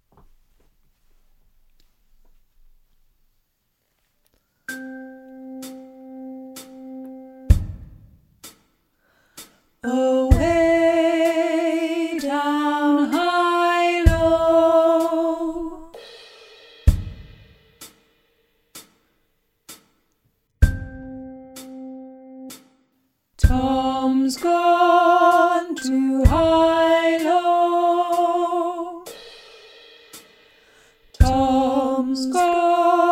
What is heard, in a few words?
Hilo Guide Alto, sea shanty singing